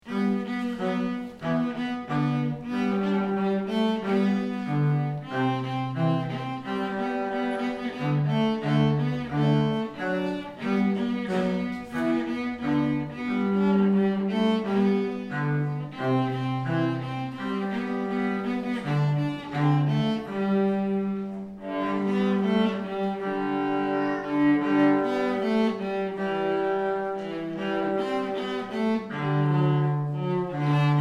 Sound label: Jingle Bells, cello